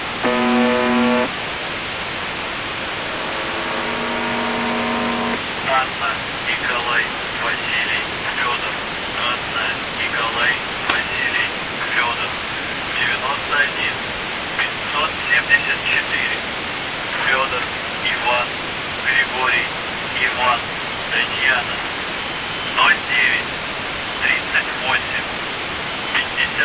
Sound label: buzzer usb msg